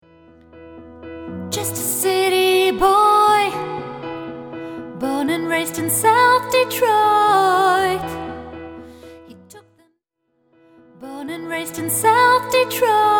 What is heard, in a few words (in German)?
SOPRAN 1 2. STIMME